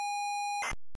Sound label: spectrum quick load